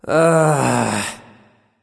nv sigh; smite; voicepack; xbalanque

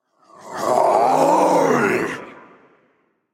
Ouch; Smite; bakasura

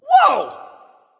fear; smite; mercury

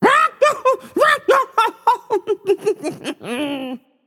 laugh, voicepack; smite; jorm

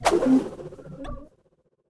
Fire; Smite; god Izanami

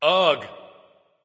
"ugh"; smite; voicepack; ullr

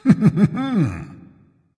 heh heh, voice; smite; voicepack; ymir

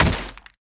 footstep; smite; raijin

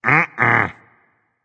disagree berserk; cuchulainn

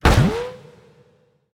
umbrella run impact; smite; vamana

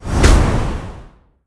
wind hit; smite; tiamat

